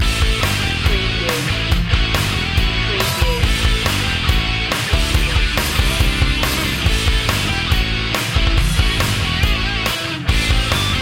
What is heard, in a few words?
rev it up loop